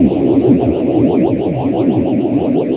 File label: Throb; Buggy; PS1